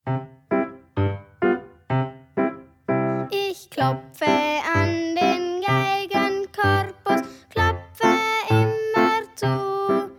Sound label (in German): klopf liedchen